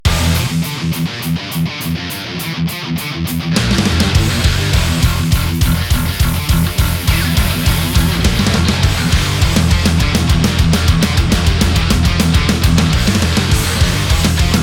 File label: higain cabs